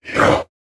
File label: pain